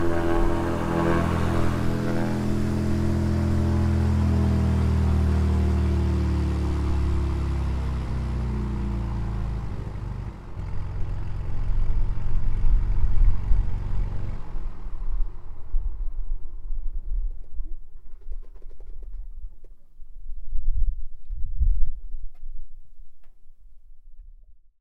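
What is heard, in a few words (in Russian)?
выключен двигатель малого воздушного судна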